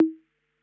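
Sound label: conga electro, percussion; Polyend; Legowelt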